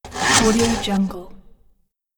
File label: saw slice